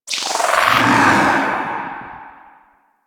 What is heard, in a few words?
Search The Dead; Warframe; Necro